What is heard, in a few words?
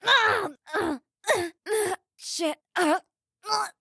elf hit sound